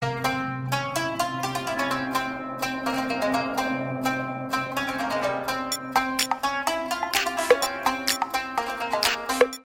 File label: Cool SMS